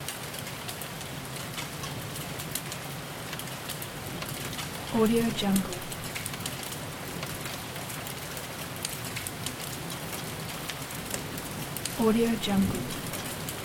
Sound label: weather hailstorm; blazznet